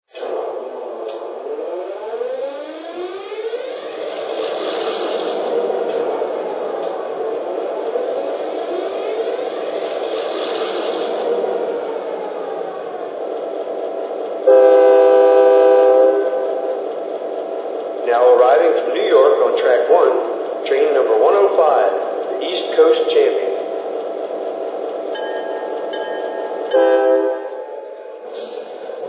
e8, trains

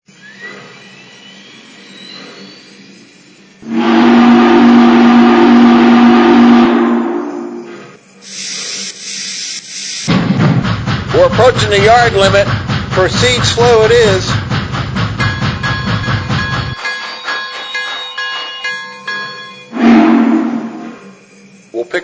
GS4, trains

